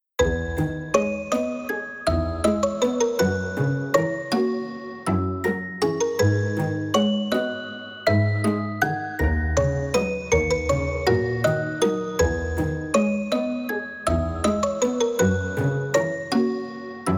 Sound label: melancholic